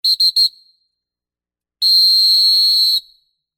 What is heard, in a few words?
micro whistle; fox 40 micro whistle break a way lanyard